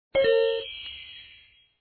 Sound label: Achievement; Shop Empire